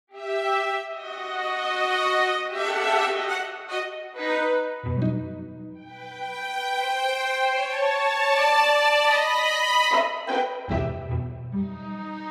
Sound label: solo and orchestral strings; SE VOL 1 plus